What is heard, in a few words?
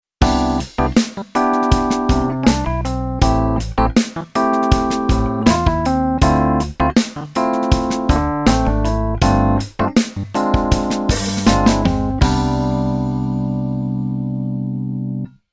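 keyboard piano pops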